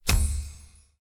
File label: UI Pop; Puzzle Chronicles